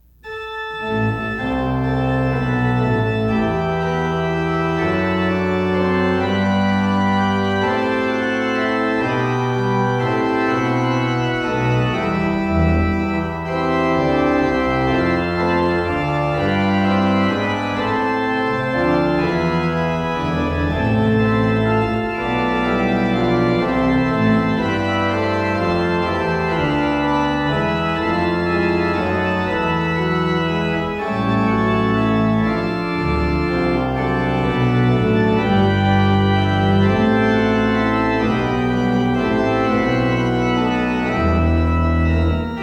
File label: Orgelbuchlein BWV 626; Jesus Christus unser Heiland; Bach